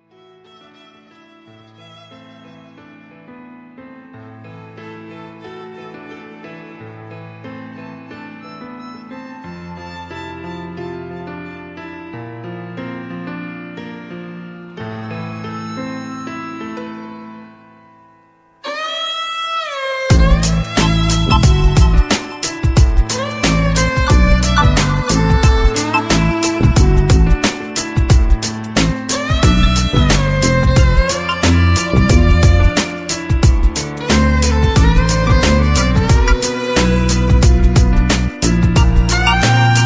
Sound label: Lounge